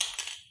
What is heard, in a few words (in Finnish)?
outo, Clangy Crunchy Foundsound Kit